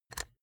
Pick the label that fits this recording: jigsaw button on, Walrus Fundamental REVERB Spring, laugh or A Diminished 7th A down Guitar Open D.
jigsaw button on